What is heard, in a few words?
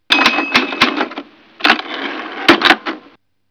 typewriter return